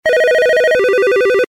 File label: Pattern Beep; LG GD910; Ring tones